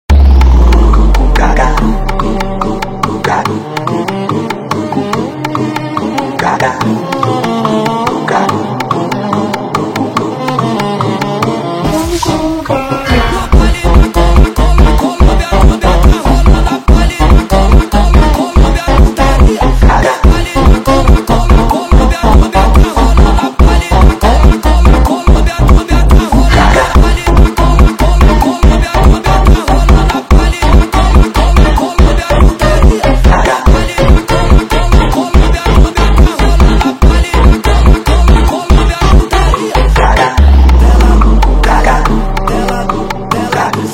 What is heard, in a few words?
FUNK Sped up